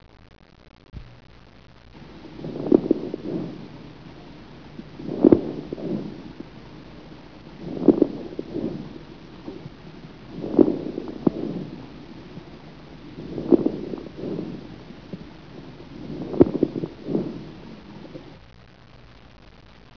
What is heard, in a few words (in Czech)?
jemne chrupky; LF:VLLP0633c Propedeutika III - cvičení; plice